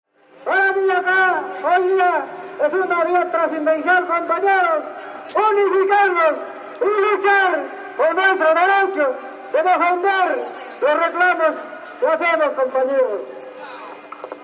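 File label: Political Rally Crowd Walla, spanish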